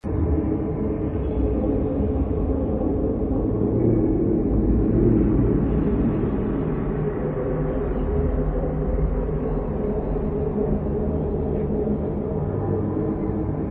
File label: loop; pirates